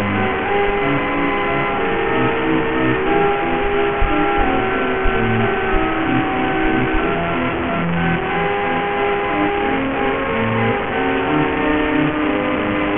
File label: song; Arduino Serial PCM Recorder